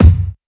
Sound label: kick drum f sharp key